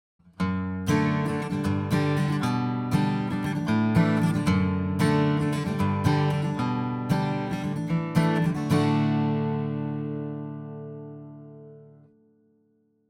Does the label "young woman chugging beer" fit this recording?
No